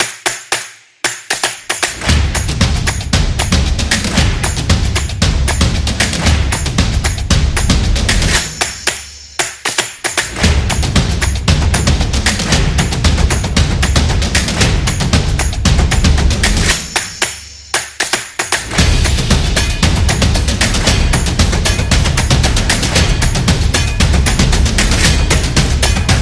Drum

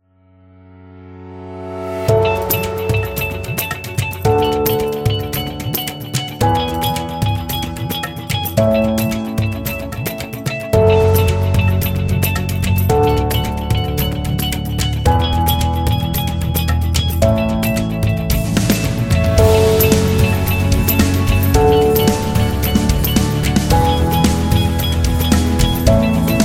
India Corporate

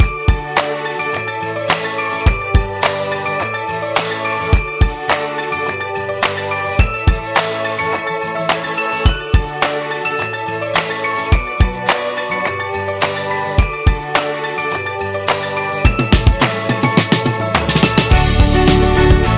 A Christmas

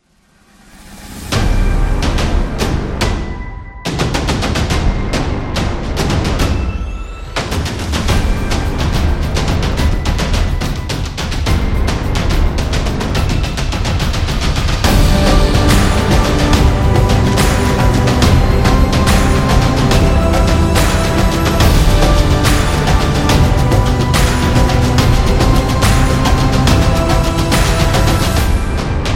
Football Epic

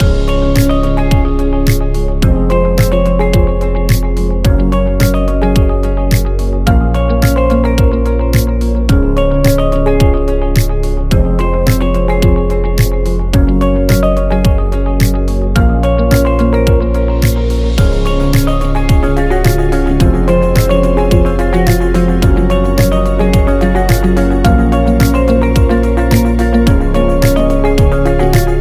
Corporate Upbeat Loop Motivational; service business

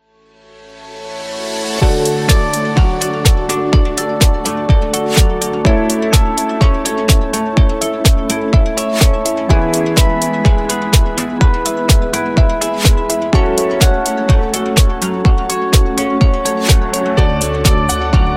Inspiring Beat; conference

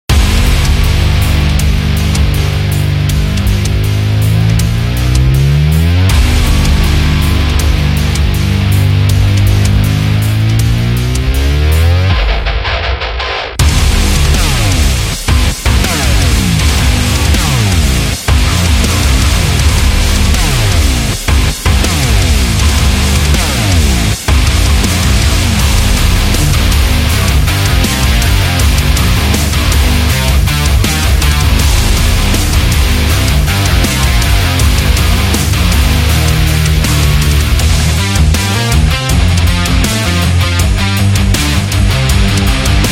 Military Action

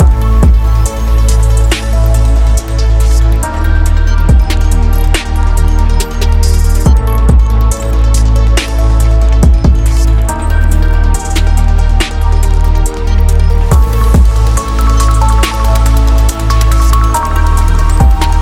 Science Loop, Modern technology background music; hi tech

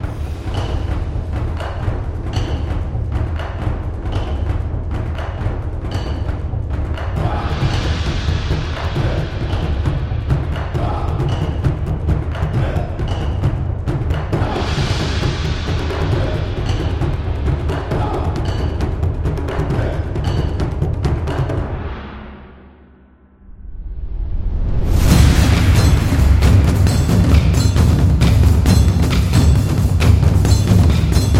Japan Percussion

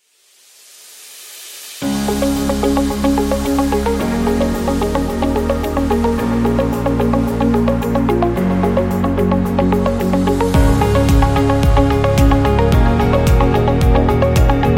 Inspiring Business

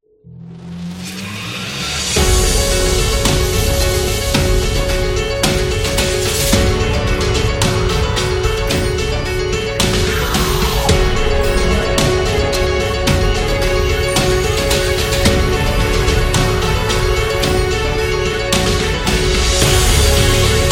Horror Chase; mystery

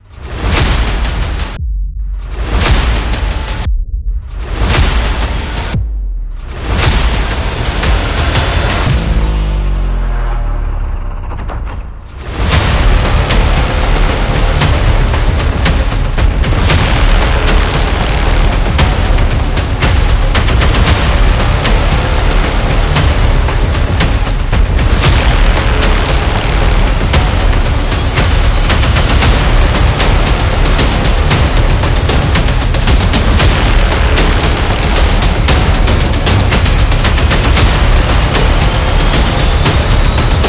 A Horror; dramatic

